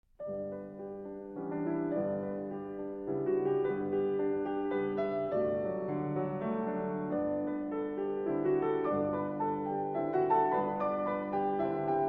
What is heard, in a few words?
Andantino; A. Jensen; Abendmusik 1